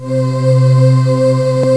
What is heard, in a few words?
BRASS